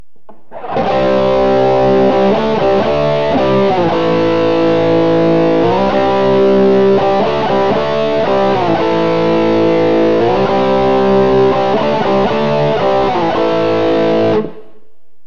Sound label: bridge crunch; dragon; Burny